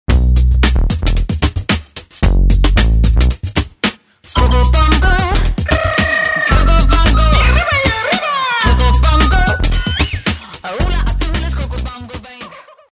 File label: jingle remix; Cocobongo